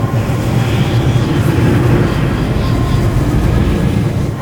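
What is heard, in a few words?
tryndamere bloodlust; LoL; demon sword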